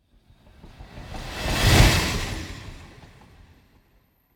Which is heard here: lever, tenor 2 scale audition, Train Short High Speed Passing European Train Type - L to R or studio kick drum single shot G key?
Train Short High Speed Passing European Train Type - L to R